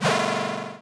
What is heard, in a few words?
Cry